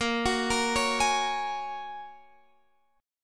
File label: Bb Minor 7th Sharp 5th, Guitar, Standard; Bbm7#5 arpeggiated